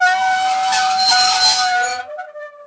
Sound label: metal squeak; World of Tanks Blitz